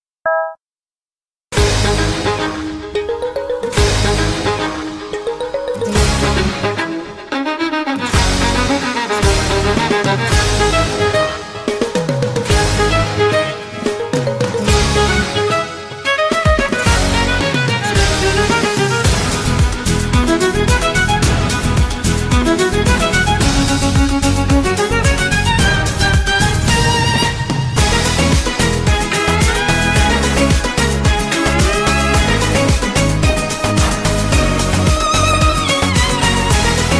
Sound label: queen of africa floor music